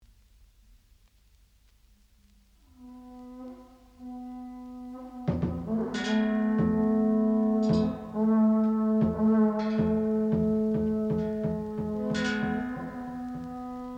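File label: japan trumpet shell taiko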